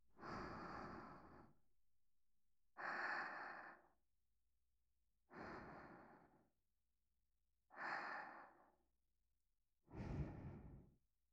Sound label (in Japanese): 寝息 右 環境音なし